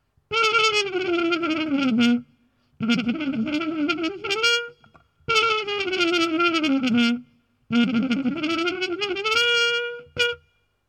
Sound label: mechanical chromatic approach mono